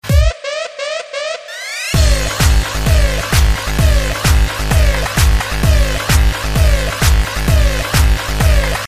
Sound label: samsung s8 ringtone